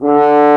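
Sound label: French Horn mf D2; Digital Sound Factory Virtuoso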